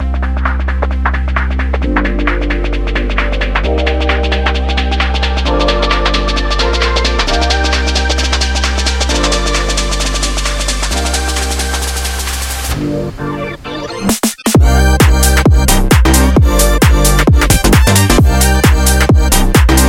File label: Construction Kit Full Mix; sickrate siik essentials iv